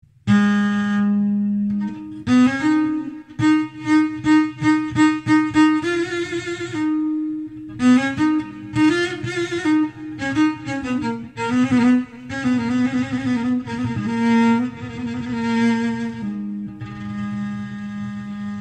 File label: ringtones Amazigh